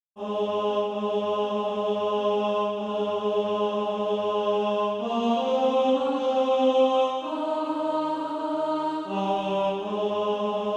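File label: tenor, Practise Music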